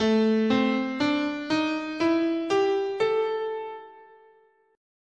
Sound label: A Blues